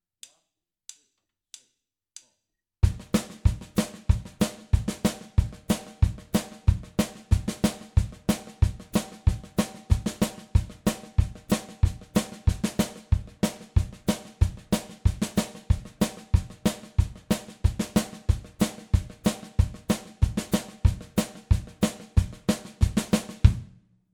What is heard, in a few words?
country train groove